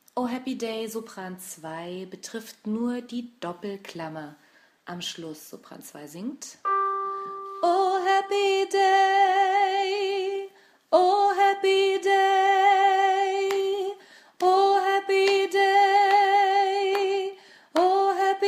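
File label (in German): Oh Happy Day Sopran, gospel choir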